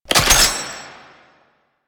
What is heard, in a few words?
Sniper Reload; DE; Warframe; Frumentarius; Exalted Sniper